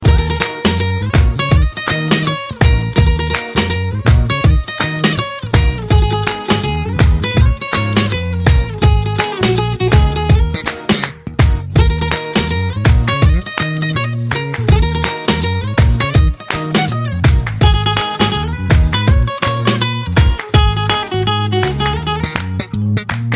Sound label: guitar clap rhythm